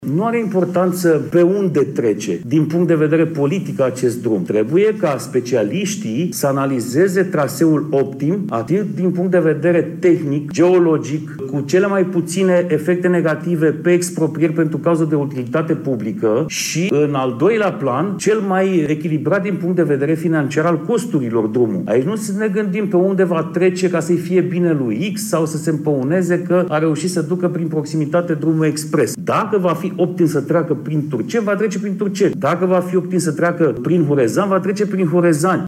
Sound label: drum